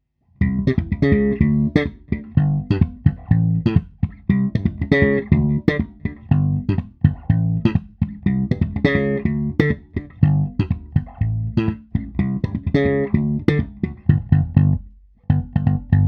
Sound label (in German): Tec Amp Taste Mid Slapped; Tec Amp Sound Test